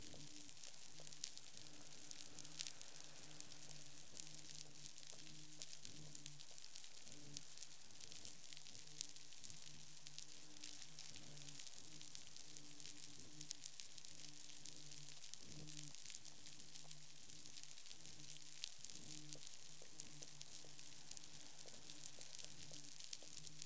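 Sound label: snapping shrimp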